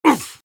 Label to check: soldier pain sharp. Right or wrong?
Right